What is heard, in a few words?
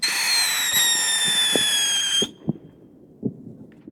firework single; best service Blue Box